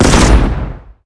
cannon attack, monster

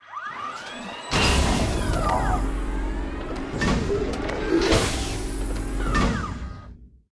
idle, monster

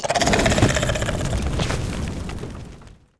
zombie dead, monster

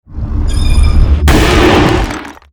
arcane golem, monster

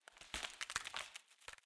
forest, lake

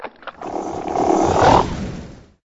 jack o lantern attack, monster

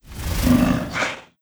wind leo main, monster

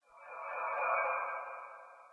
warp

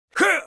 attk

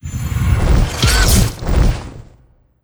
Boss Lighting Horse; monster

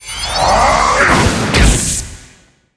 dark archer attack

